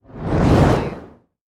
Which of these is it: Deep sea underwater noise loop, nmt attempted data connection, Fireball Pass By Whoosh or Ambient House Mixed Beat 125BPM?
Fireball Pass By Whoosh